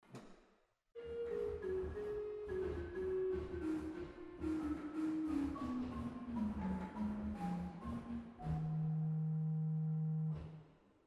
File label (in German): Roehr Floeten Bass 4, organ; waltershausen